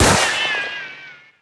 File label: win shot; Wild Guns